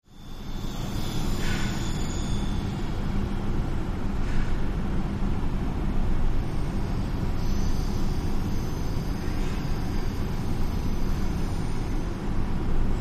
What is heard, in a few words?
Indistinct Factory Floor Ambience- No Vox Whining Machinery